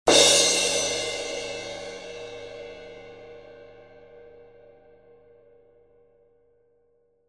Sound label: 18in Custom Fast Crash, cymbal